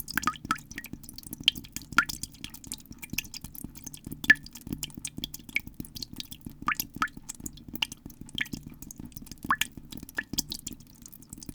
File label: water dripping; best service Blue Box; water fx